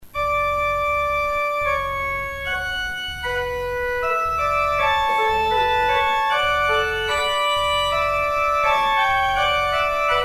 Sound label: organ outro